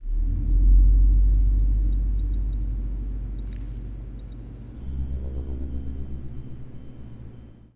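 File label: HEIST NOISE; GTA 5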